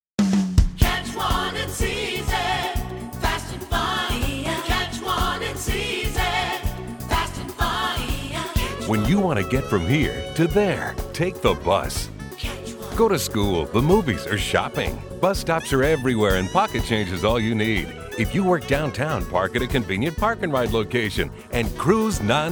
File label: Jingle; VIA